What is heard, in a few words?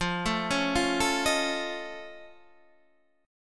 F Augmented 7th F Guitar Standard, chord; F+7 arpeggiated; 13,12,11,10,10,11; JGuitar